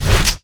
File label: charged shot; LoL; graves